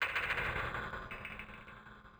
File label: BREATH